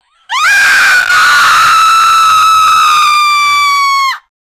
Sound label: one girl and two boys, fear